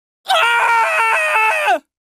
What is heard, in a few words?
Man Screaming